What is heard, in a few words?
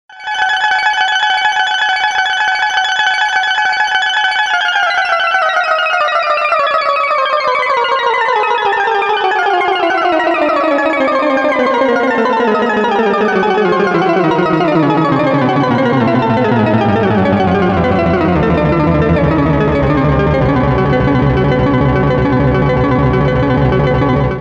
cascade, Tri VCO